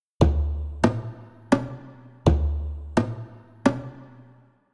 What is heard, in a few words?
drums